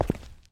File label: concrete